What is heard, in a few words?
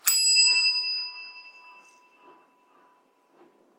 bell star